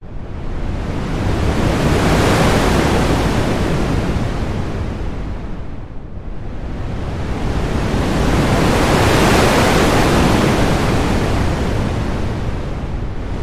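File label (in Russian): тяжелый ветер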